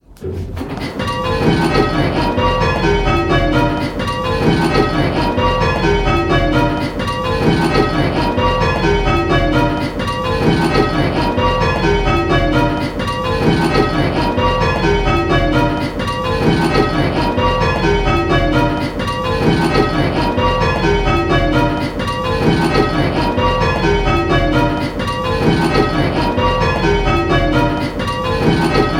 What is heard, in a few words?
Penta, bells